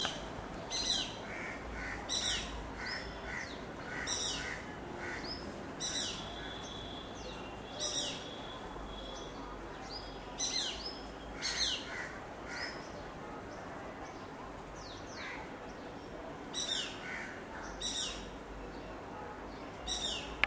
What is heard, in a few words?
accipiter badius